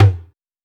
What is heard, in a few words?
tom one shot hybrid; Percs